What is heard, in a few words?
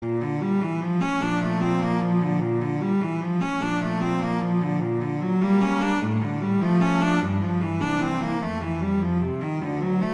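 Etude, cello; Popper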